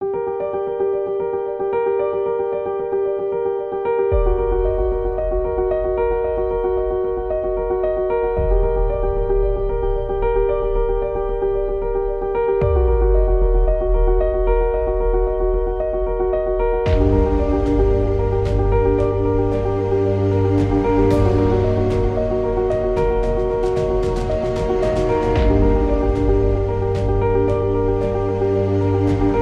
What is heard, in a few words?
Evil